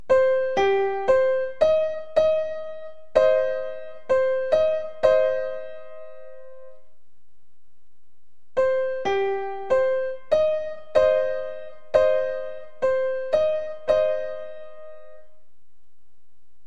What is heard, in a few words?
four minor thirds